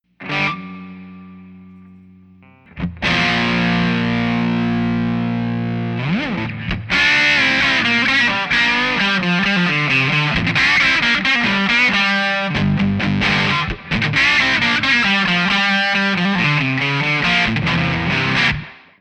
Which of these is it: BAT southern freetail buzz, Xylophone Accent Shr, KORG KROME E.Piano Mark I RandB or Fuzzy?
Fuzzy